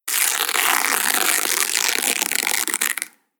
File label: Tearing Fabric; Ripping Sound Effects; Foley